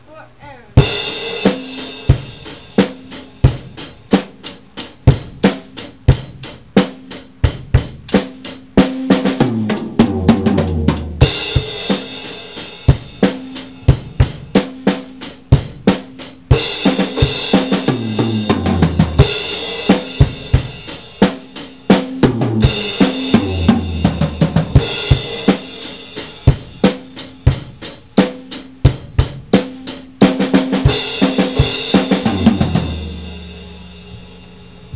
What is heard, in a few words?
solo, drums